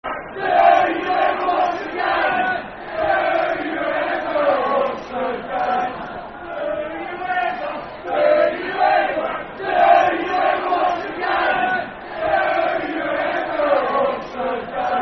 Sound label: Football Chant (Soccer Song); Do You Ever Watch The Game; Leyton Orient Lofc